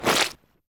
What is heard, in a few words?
wet splat; Impacts; Thalassophobia Restored